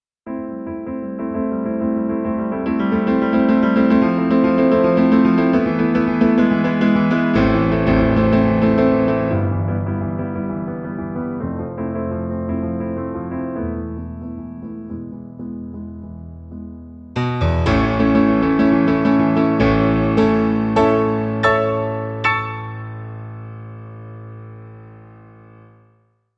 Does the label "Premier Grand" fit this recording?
Yes